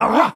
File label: growl; Voice; ooh ahh